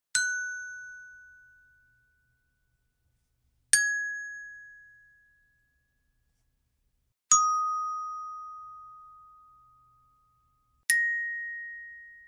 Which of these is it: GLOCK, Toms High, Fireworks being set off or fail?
GLOCK